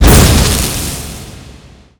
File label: spell meteor cast; Garry's Mod; tf2